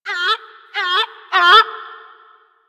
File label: Forest Animal Cries; Warframe; Ambience; Grineer Forest